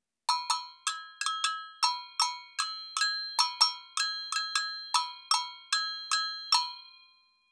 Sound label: Agogo afoxe